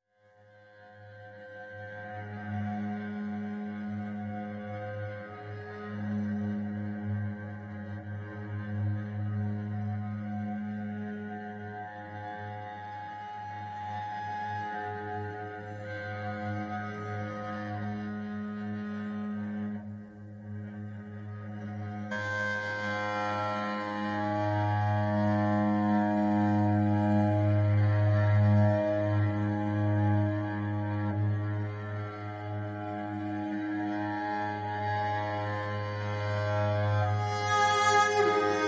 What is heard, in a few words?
Cello Only Mix